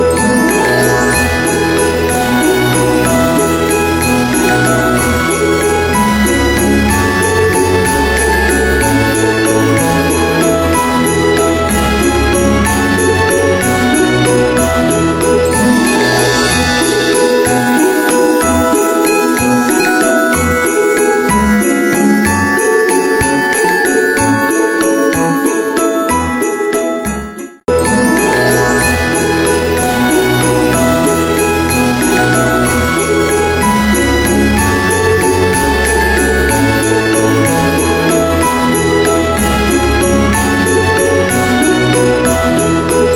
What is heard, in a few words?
upbeat music chrismas